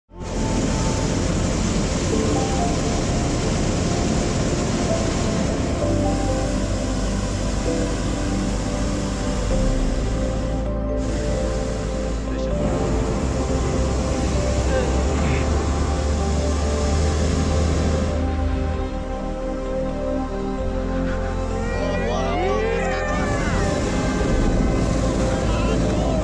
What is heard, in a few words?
Hot Air Balloon